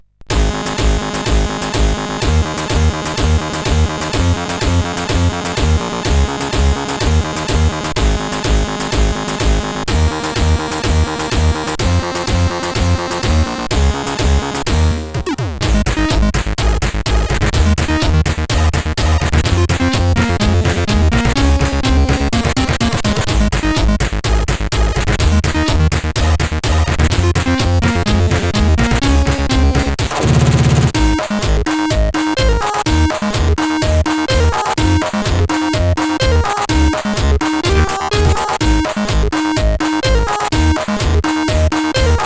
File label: CSG8580